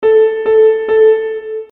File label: 3 4 time signature